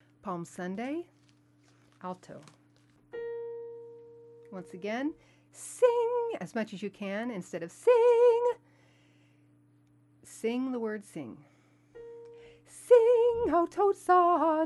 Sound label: alto alone; alto oratorio; Palm Sunday